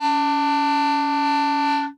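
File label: D sharp 4 with F5 with C sharp 6 with E sharp 6, clarinet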